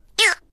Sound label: rubber toy short